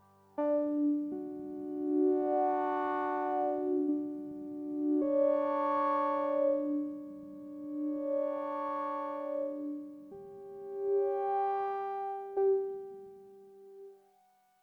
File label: D drone